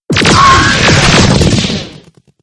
blast; cybug; heros duty

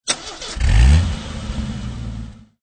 Car Start; zeke and luther; car customizer

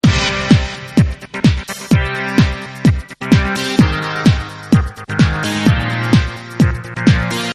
character music

